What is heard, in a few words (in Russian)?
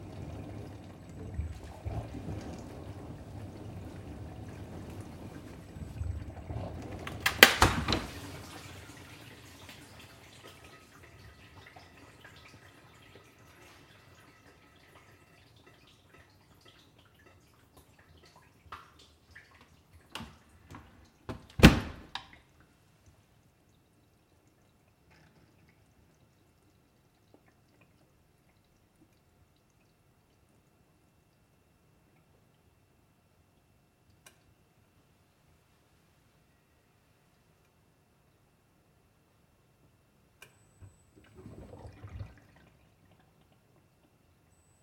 posudomoechnie mashini otkrivayutsya i zakrivayutsya vo vremya raboti